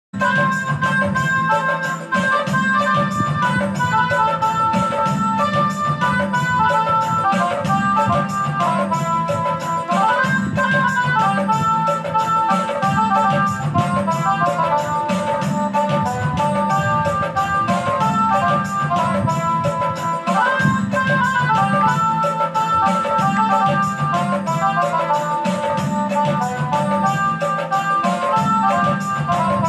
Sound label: desh bhakti song instrumental; 26 january